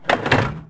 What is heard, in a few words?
lever; Severance